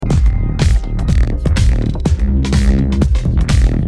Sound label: Loop